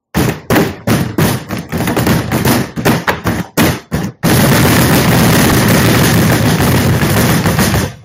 table slamming

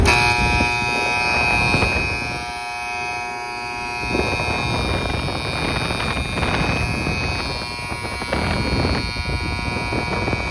loud ac

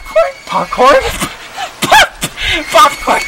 popcorn

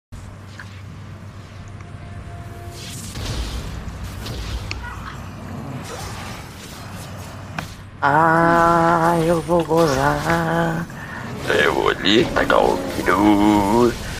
ahhhhhhhhhhhhh

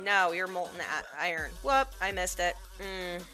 missed whoop

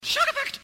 shock effect

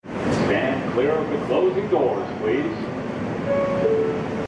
door close; mta

